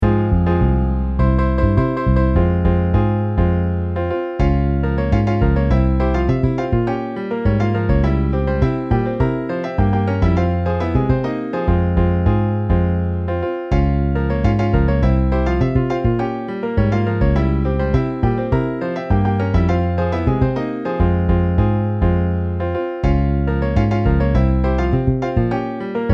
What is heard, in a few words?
Piano Bass MIDI; Timba